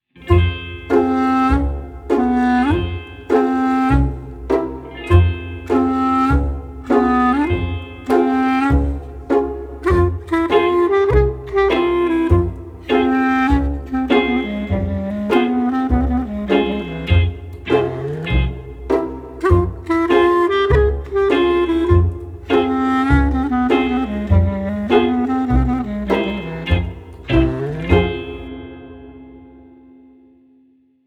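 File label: sweetness acoustic guitar pizz; by burak sound bank; cheerful vol 14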